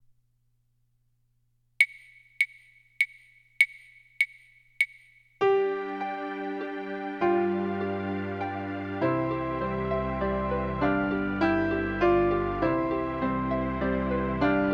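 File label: solo with accompaniment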